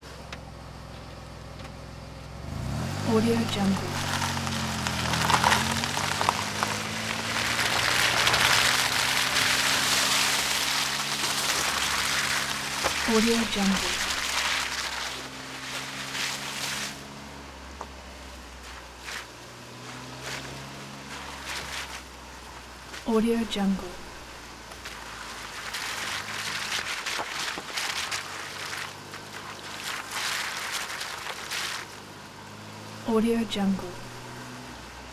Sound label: vehicle through mud recorded close to tire slow driving